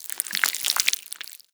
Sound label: flap long, zombie blind jaws; Half Life Alyx; creatures